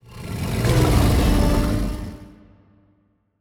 kaiju adult rage roar; biogenesis

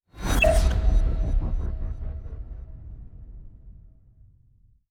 notification destiny trait; notifications; Stellaris; paragon

